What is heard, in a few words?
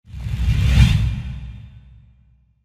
woosh